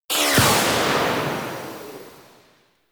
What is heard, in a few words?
Hand Rocket Launcher Tail; Weapons; Warframe; Corpus